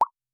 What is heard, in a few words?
message sent